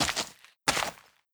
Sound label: Goral Walk